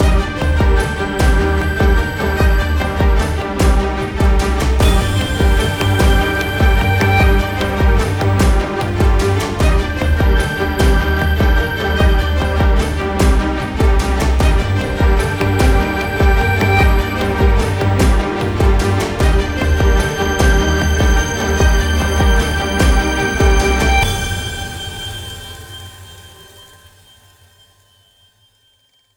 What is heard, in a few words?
cinematic; by burak sound bank; tension gerilim vol 53